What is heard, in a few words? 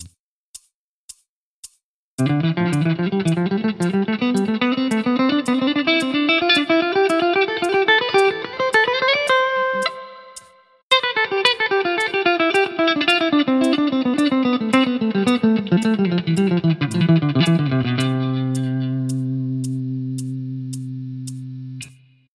ascending and descending quads